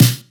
Snare, drums; Ableton Live 12 Lite Core Library

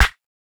Kick Stereo; One Shots; Drums; Hare

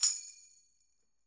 Tambourine Long; Drums

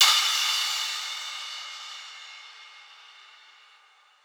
Crash Squashed, Cymbal; One Shots; Drums; Ableton Live 12 Lite; Core Library

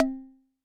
E-Perc Knock Buzz, Electronic Percussion, Drums; Ableton Live 12 Lite; Core Library

